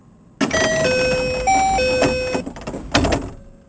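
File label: 223 door open; ２２３系２０００番台ドア開閉音; 録音：管理人; BVE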